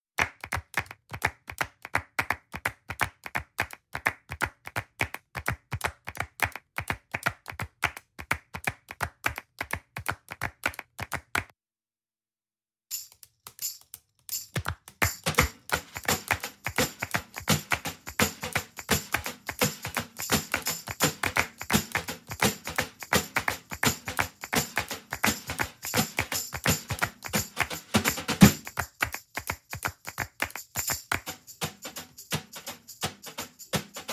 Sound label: perc only